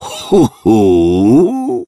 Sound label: "hooou"; Kinzo